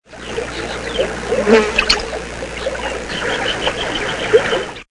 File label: swamp